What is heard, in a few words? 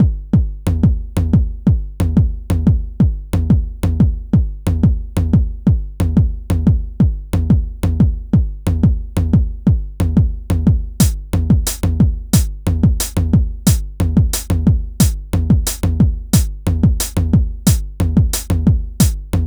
drum; These are some experiments I made using GNU/Linux to produce simple drum and bass tracks